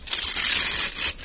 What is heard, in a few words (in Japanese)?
ジャラジャラ・・・, 生活音; 生活音系効果音